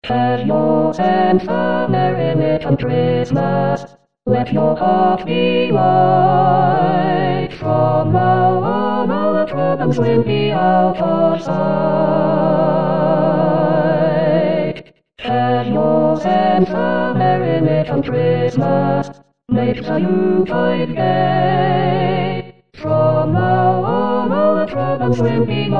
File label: All voices